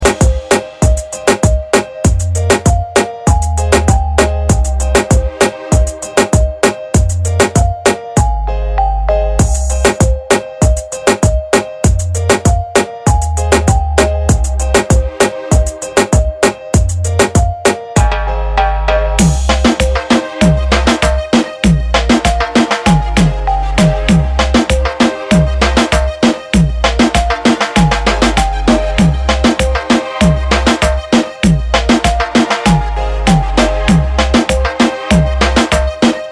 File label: instrumental reggaeton